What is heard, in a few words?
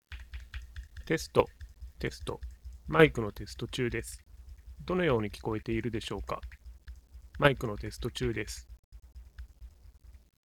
typing